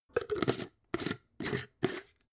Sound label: jar glass plastic close slow